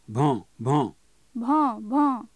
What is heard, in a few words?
dog